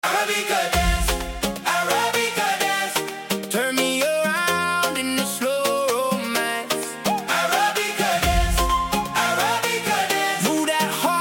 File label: arabica dance